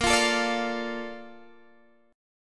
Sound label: A sharp Major 7th